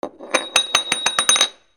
FALLING

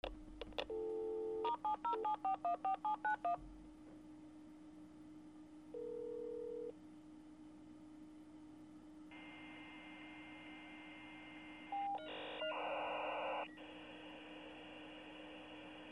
PHONES

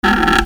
SQUEAKS

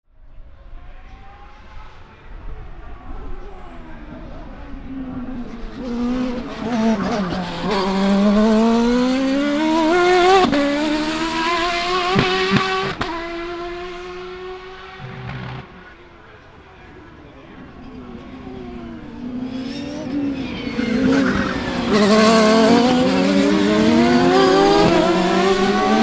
CARS

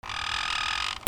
BIRDS

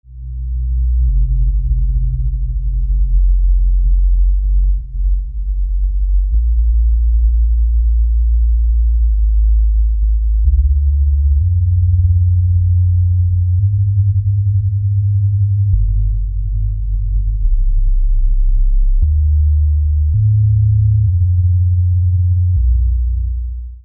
MUSICAL

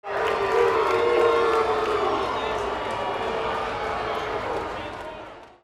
CROWDS

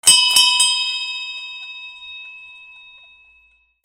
BELLS

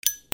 FIRE

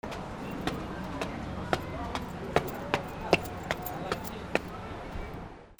FOOTFALL

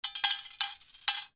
MONEY

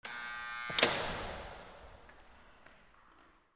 BUZZERS

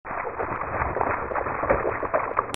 BATHROOM

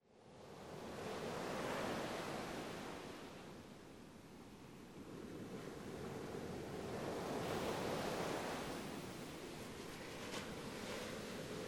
WATER

